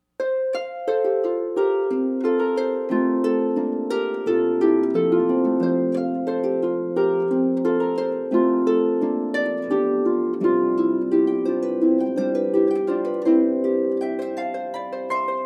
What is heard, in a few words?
Gavotte, Harp; Kirsten Carrell